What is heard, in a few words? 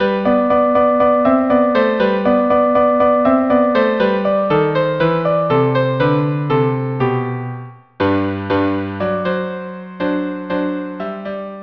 Dayenu violin cello; Dayenu violin cello duo